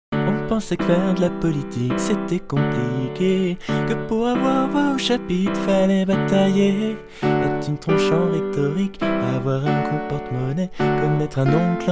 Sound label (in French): vraie piano